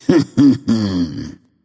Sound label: hehheh; smite; apollo